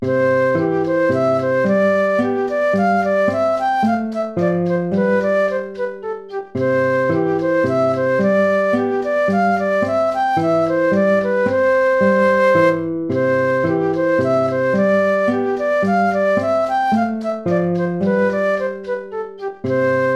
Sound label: tempo minuetto; hook james